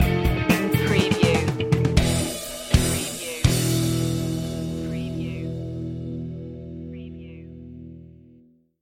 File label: walk in style stinger